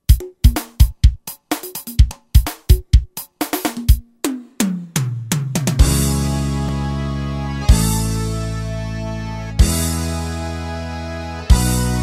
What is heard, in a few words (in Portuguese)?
ritmos roland g70 balada sertanejo